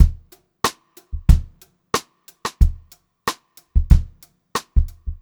Funk-Groove Drumloops

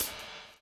DOWN, DOWN KIT 78

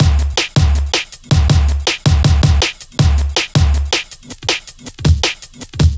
Swing, drum loop, jungle, 160.5; Zero-G Jungle Warfare 1, 2 & 3

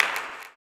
CLAP, blues & soul; ILIO; Vocal Planet

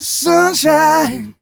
m 128 mel e-flat, Vocals

